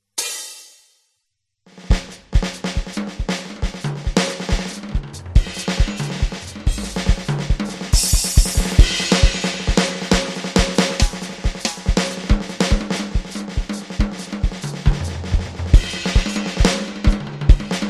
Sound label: drums solo